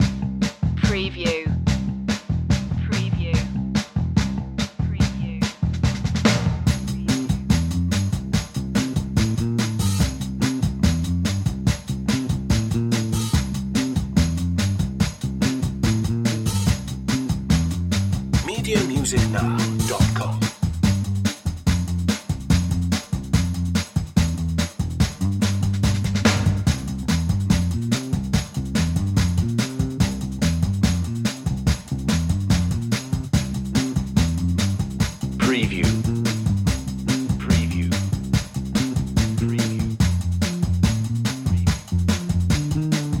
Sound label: bass and drums